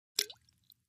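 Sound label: Splash Small Plop Glass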